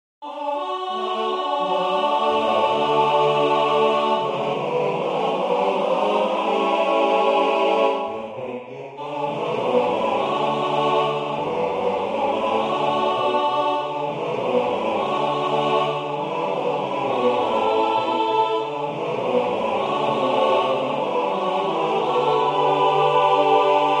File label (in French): harmonie barbershop ttbb; bon anniversaire